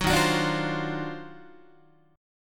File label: D sharp Minor Major 13th D sharp Guitar; strummed